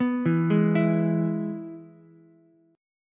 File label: G sharp Minor Sharp 5th, Ukulele; G#m#5 arpeggiated; JGuitar